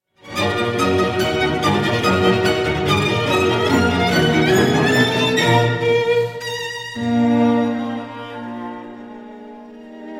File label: quintet in a minor for strings 4th movement allegro; max bruch; 1918